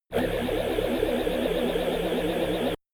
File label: shot buzzing wavy tooth low; zaps buzzes